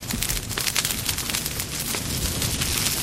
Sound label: campfire; Project Zomboid